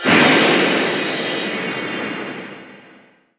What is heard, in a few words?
spell throw; HPSS PC